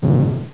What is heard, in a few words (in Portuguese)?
buuuum baixo, atari